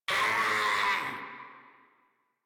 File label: Infested Runner Attack Call; Warframe; Runners